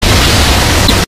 depth jump; slender

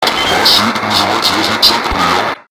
rbuzz; slender

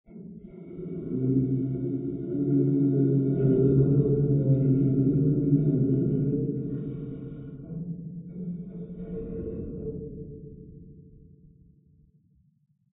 animatronic moan; slender; tjoc

